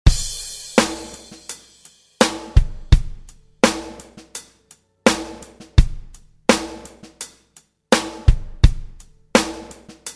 Re Drum

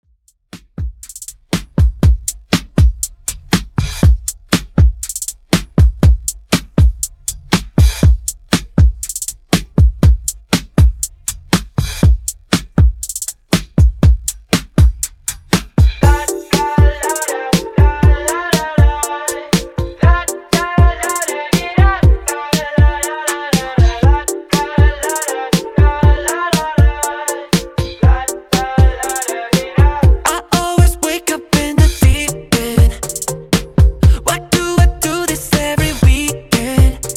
Top Redrum

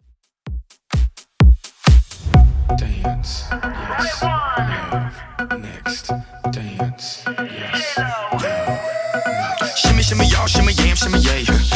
2ks Redrum 128bpm

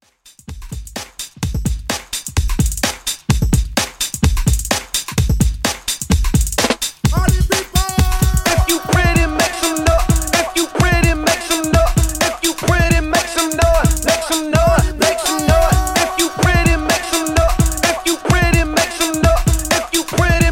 90s Remix Redrum 128Bpm

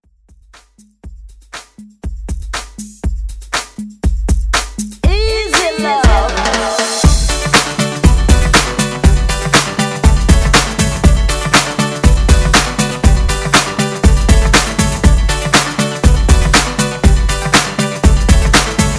Pop Redrum

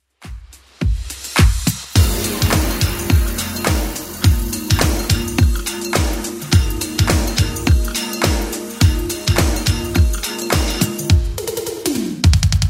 80s club 105 bpm clean; 80s Club Edit

